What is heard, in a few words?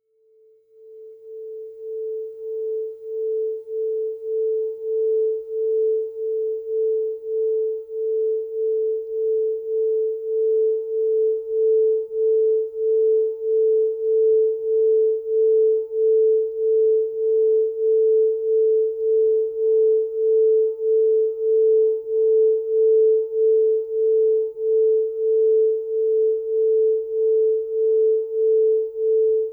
singing bowls; Yoga and sound